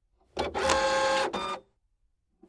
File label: Computer Printer; Ink-jet Printer Warms Up And Cleans Print Head.; Fax Scanner Copier